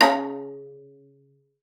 C#3, VIOLA FINGER; Xsample VOL-5

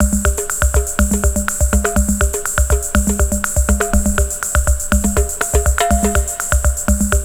LOOP 122; Best Service; Hyper Dance

